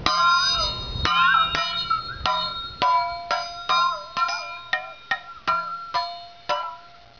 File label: meinl liquid triangle